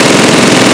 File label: mg42 loop; Modern Warfare